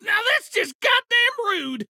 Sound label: hit reaction; abe; Blind Drive